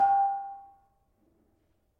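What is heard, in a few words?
Panerus G5, gravity harps tuned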